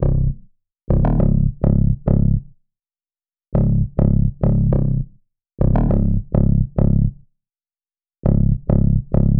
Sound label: West Coast Melody Loop - 102 BPM C Min Bass; Melodies; Loop Stems; Cymatics; Oracle Sample Pack